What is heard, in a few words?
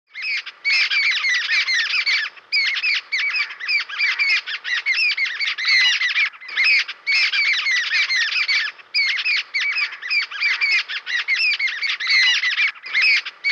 Babblar, bird call